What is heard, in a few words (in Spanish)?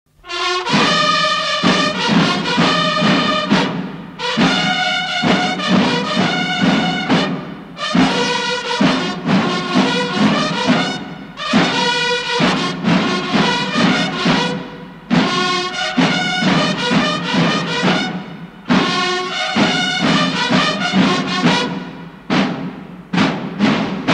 Toque de bandera instrumental